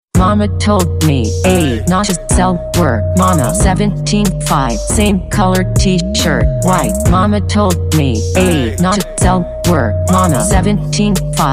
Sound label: remix